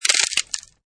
Splintter, Crunchy sound of wood splinters breaking; Could be use as bone cracking sound with pitch shifting; Combat; Injury Sound Effects